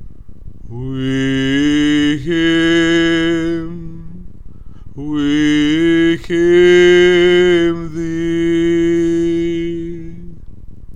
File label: VOICE; We hymn thee